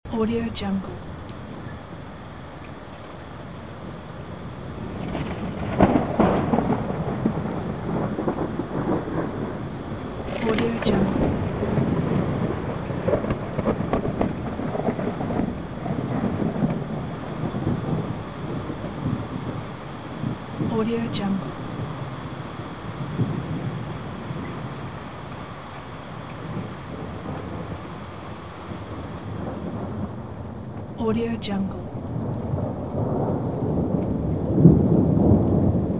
storm with strong thunders ambience loop; fxprosound

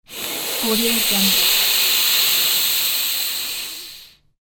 sniff

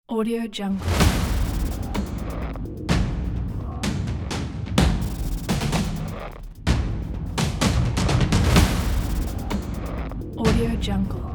the percussion war; musicspacestar

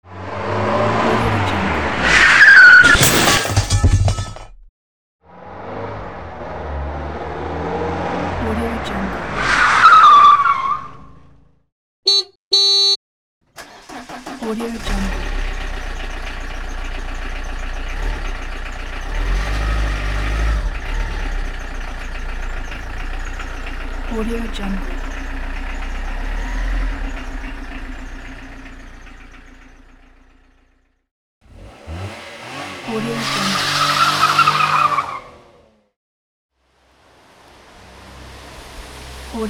car effects pack

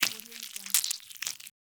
flesh crush squelch

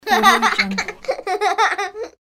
child laughing

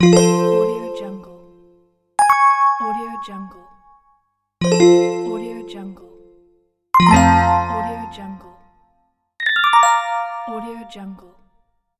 gui android games fx sound

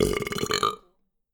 male burp

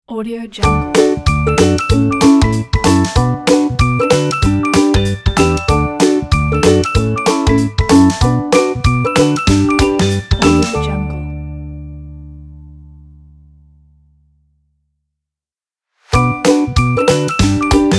upbeat happy kids logo

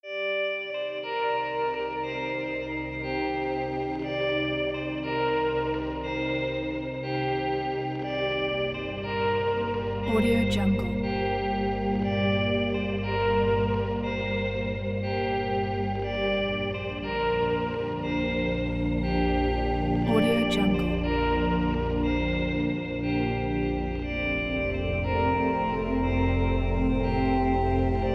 inspiring cinematic ambient guitars

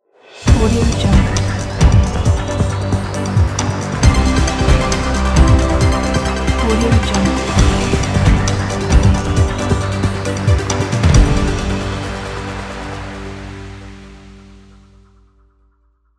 tv news logo

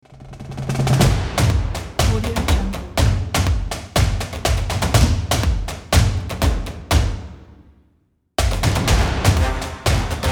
the marching band

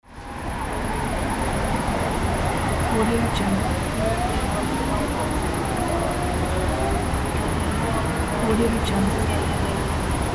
city noise

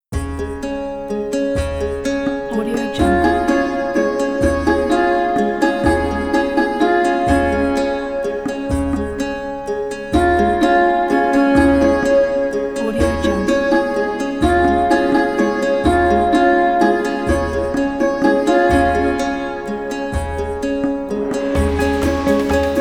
indian raga

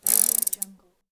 sprocket spin short

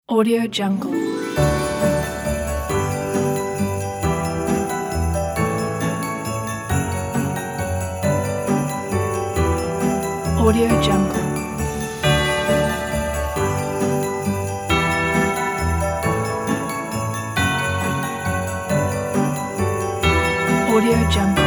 merry christmas

